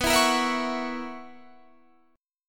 B Major 7th Suspended 2nd Suspended 4th, down, Guitar, Half Step Down